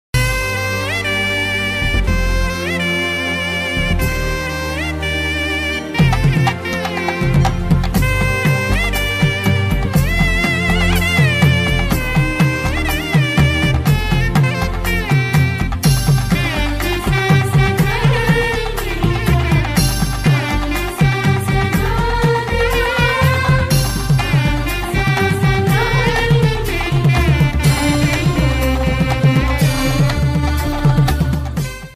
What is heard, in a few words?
tamil marriage song tamil wedding marriage bgm